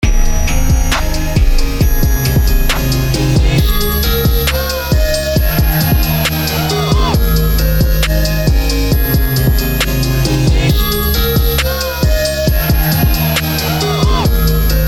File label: laserflip; beat tape